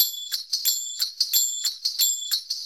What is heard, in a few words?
Percussion, Indian